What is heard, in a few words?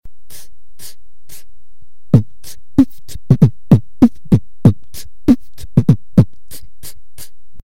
hihat